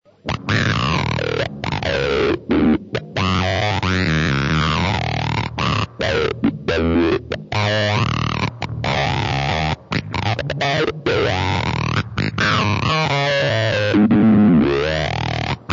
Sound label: duck